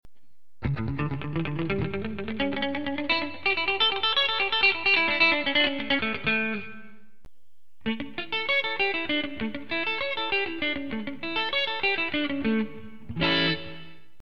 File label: Penta Myxolydian, guitar